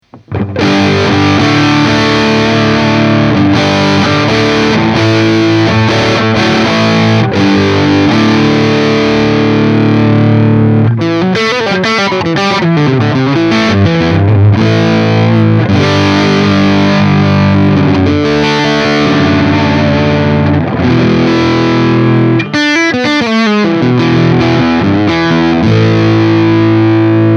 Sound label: EL34 Maximum Gain